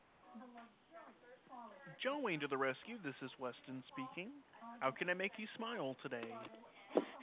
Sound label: Tone Excellent